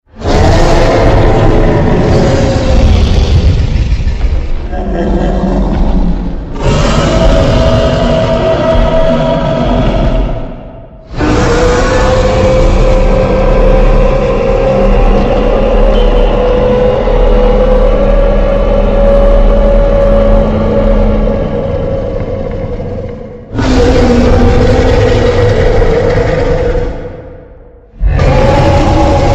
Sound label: Yeti, هیولا; یتی